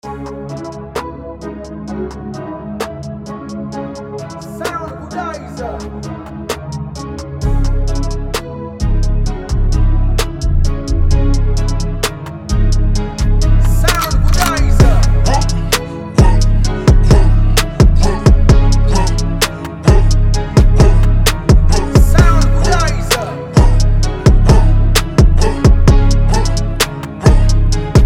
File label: ENERGY BEAT